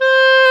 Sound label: WND CLAR F, Clarinet